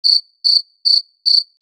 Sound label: Crickets chirping; insect